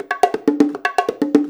FILL, Percussion

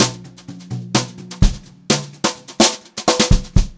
Brushed Drum Loops; USB Soundscan vol.39; AKAI

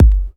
Bassdrum Clean

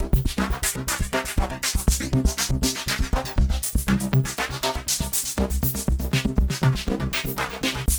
Synth

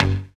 ZOING, Synth Bass, Roland

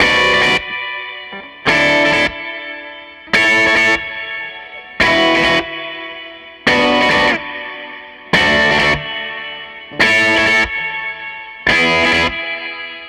SG Guitar Loop

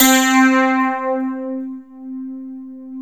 DX7 STRATO; STRINGSYN; Alpine Volksmusik

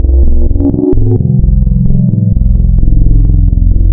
Spectral, wavetables; Architecture 32

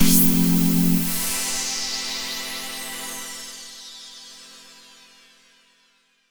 Epic Pad, Underground UK House & Electro, Pads; Sonic Foundry (Sony Creative Software)

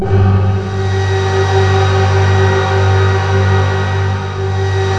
EMU IMITE, SYNTH FXS; East West - Global Sounds

